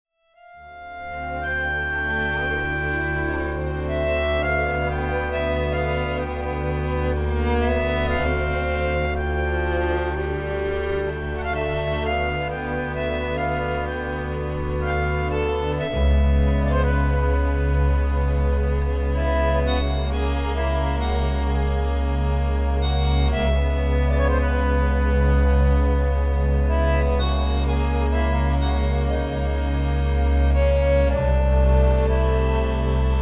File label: Tango